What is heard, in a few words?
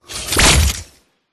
weapon gun gunshot futuristic; PMSFX